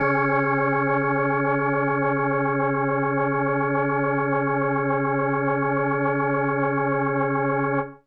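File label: Indie Organ Kawaii C#1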